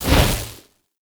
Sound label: Fireball; Warframe; Caliber Chicks Minigame; Boss